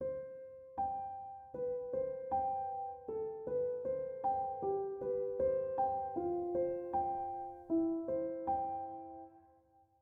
simmersprings resort head 78bpm CMaj 12beats

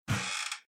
Open Door

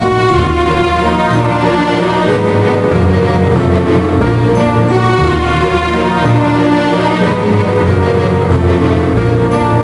Loop; Junior Mystery Quest

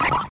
down test

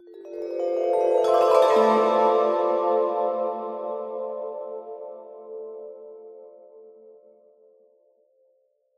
Puzzle Complete

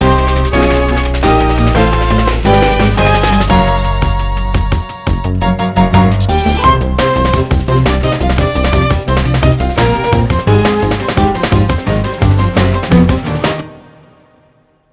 172bpm; chicory a colorful tale; ps4

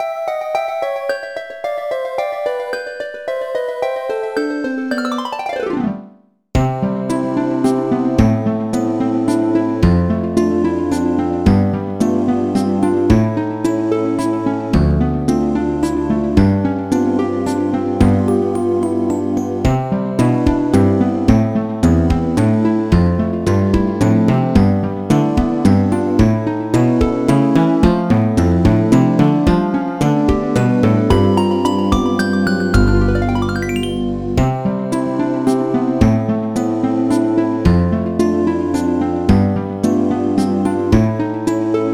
BGM Staff Roll; Moshimo Kinen Surunara...; 2008 nds gamerip

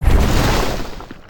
grab; Fight Knight

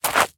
Jump Dirt Jump

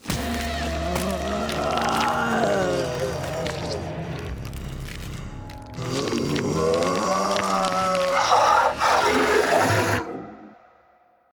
Enrage Transformation; The Inn; Zara

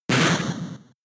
Hail turn damage

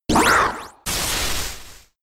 Acid Spray; Pokemon; Gen 5; Blk Wht Blk2 Wht2

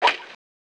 Wood Shot; Mecarobot Golf; SNES

